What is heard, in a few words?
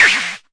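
mbrute snare, Arturia MiniBrute; DRUM MACHINES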